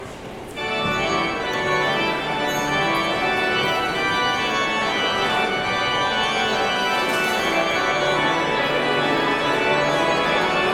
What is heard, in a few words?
Postlude